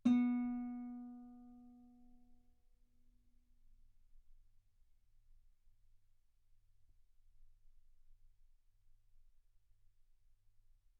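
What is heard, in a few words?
Guitar ff B string B3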